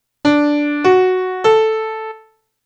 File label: piano